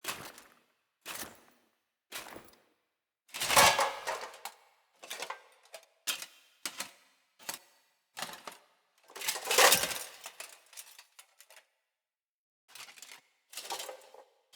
Vision Foley; Bromius; Synod; Envoy

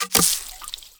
Pool Noodle Catch, Melee

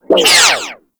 Corpus Energy Proj By

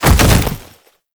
Bodyfall Light; Warframe; Lasrian Soldiers; Foley

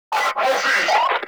Ammo Cast Vocal; Frumentarius

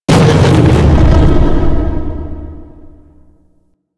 Antimatter Drop Explosion A; Warframe; Warframes

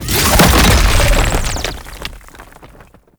Egg Mine Burst; Warframe; Tau; Fallen From Heaven

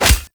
Unarmed Tempest Punch Impact Default; Weapon Impacts; Combat

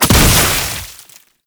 Boss Tripwire Explosion; Enemies; Gameplay; Warframe; Oraxia

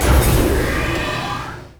Tram Start; Warframe; Grineer; Tram Connector Curved

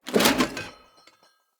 Corrupted Battle Dog Foley Jump

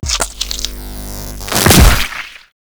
Infested Ancient Melee Mid Spin Flesh

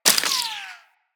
Bullet Impact Debris Wood

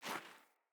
Grunt Boot Walk Gravel, Footsteps, Boots; Enemy; Sinecure; Melee Grunt

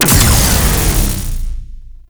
Shield Down; Warframe; Captain Vor; Abilities Redux

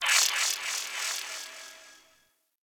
Corpus Ship Data Chatter, Ambience; Warframe; Corpus Ship Remaster

